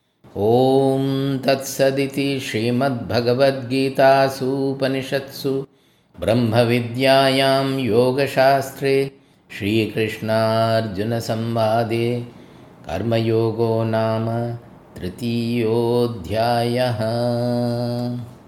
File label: Ending Chanting; chapter 3 karma yoga; bhagavad gita; conclusion